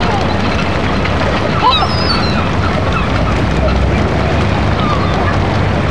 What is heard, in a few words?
ocean light loop